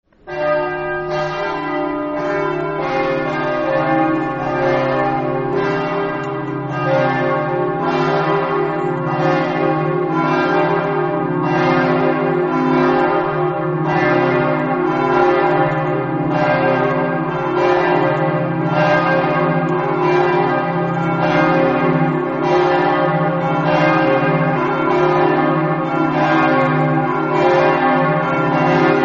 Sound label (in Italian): campane